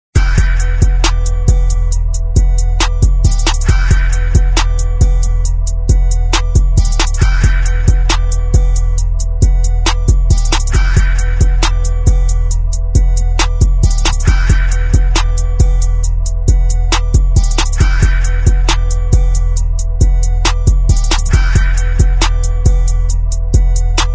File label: Hype intro